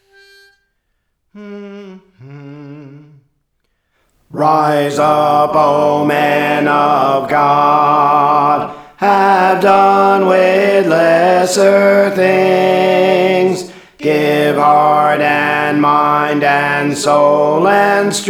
Alto; Praise For The Lord Training

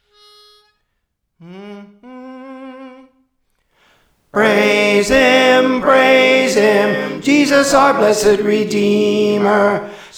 Tenor, Praise For The Lord Training; Praise Him Praise Him

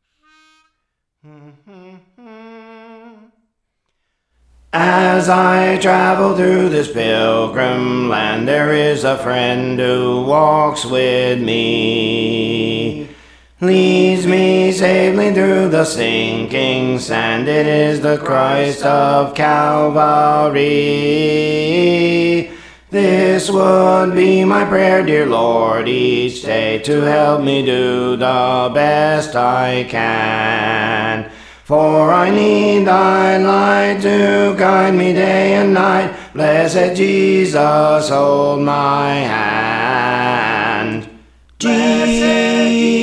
Alto; Praise For The Lord Training

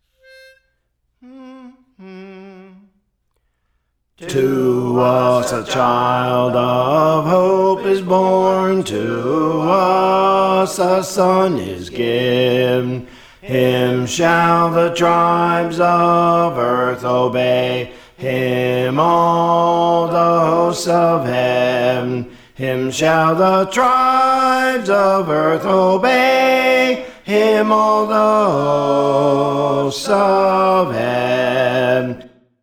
Bass; To Us a Child of Hope Is Born; Praise For The Lord Training

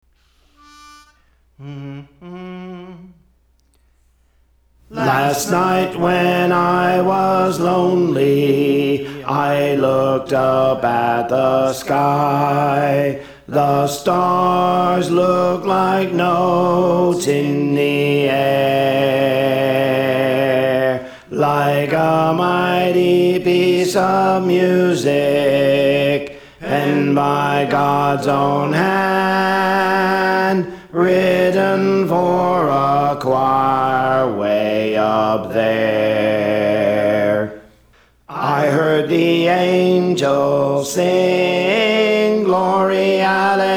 Alto; Praise For The Lord Training

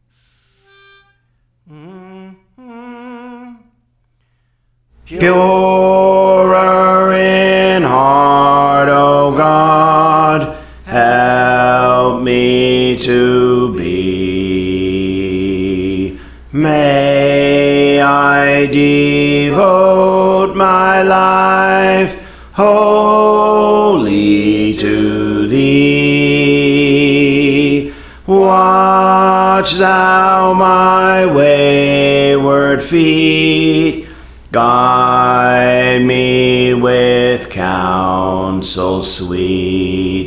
Bass, Praise For The Lord Training

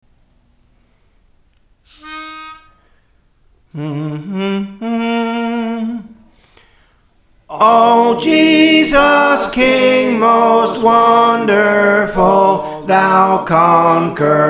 Soprano, Songs; Praise For The Lord Training

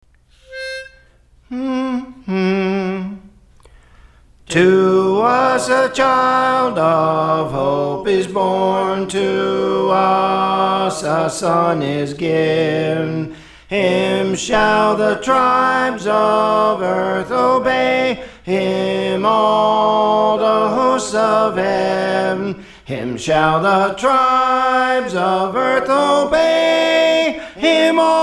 Soprano; To Us a Child of Hope Is Born; Praise For The Lord Training

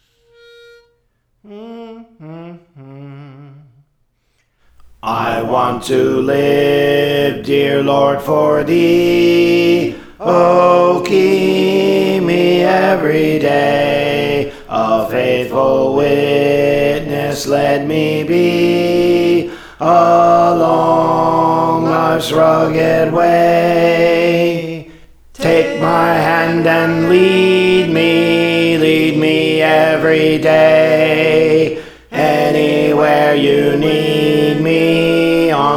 Alto; Praise For The Lord Training

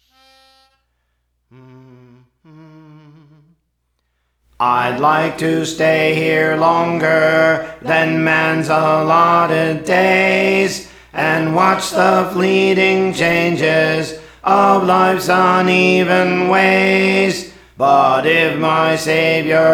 Alto; Praise For The Lord Training

